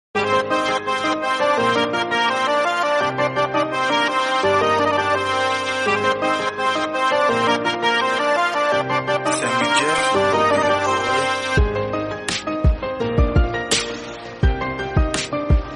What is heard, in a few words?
instrumental version